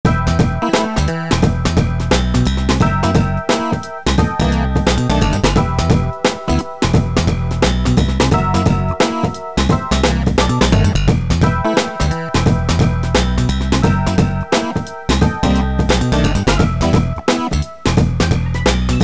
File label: Funky hop